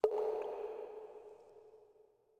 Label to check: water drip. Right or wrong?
Right